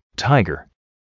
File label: tiger